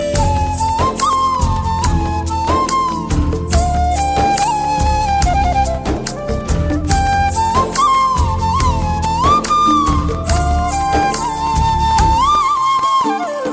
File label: flute instrumental popular ringtone